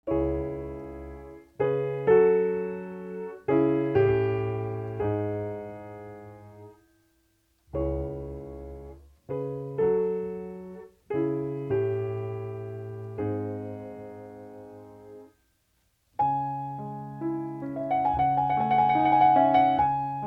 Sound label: Nocturne in C sharp minor; Opus posthumous